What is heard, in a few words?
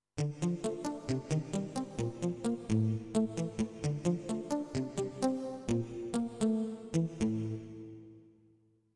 Mute Steel Gtr